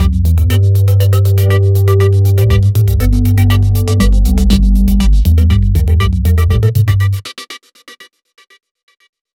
lectro pop drop basse ron; electronic music

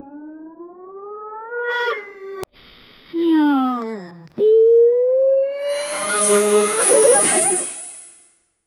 un son seul dune chambre, indoor

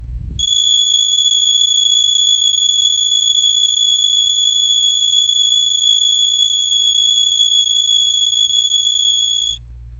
doux vrombissement aigu, electric vehicle

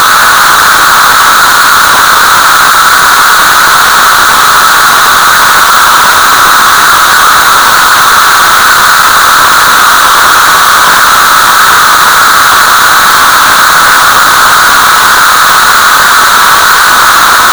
mouvement de globules bla, biology

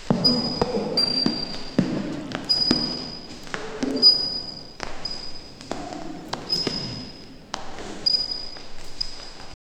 une personne marche en ba, gym

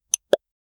effet sonore de clic, interface

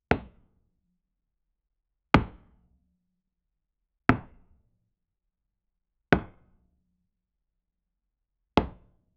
jeton d'échec qui tape le, board game

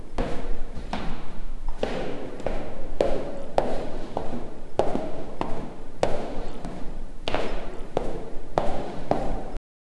une personne marche en ch, gym

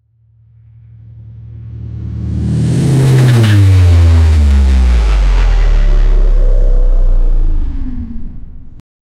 moteur de vaisseau spacia, spaceship

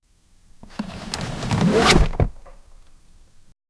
ouverture d'une chaise pli, camping

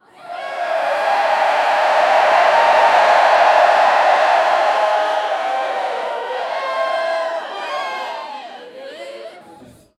foule nombreuse dans un c, concert

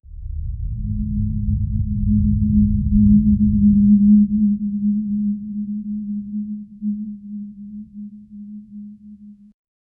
je voudrai un son mystrie

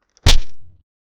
dans un jeu de cartes, card game

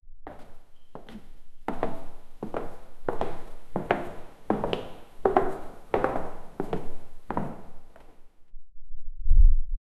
planch plafond en bois; wooden

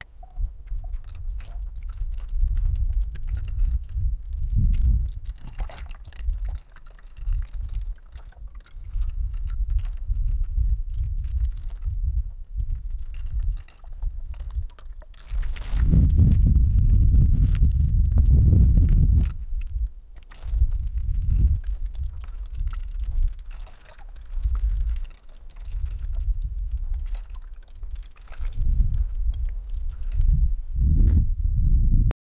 corne de brume bateau

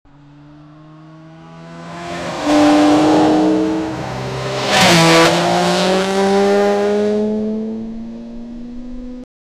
voiture de course passant, motorsports

